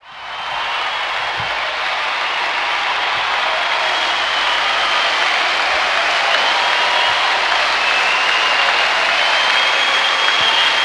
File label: APPL, XP APPLAUSE